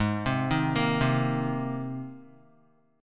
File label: G sharp Major Add 9th G sharp, Guitar; G#add9 arpeggiated; JGuitar